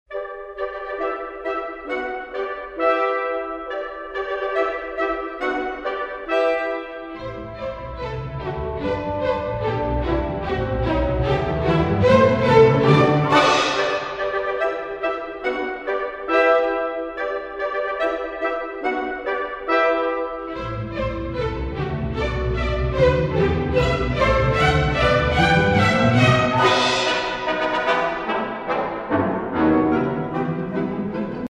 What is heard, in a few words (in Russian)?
Marsh; Chaykovskiy; classic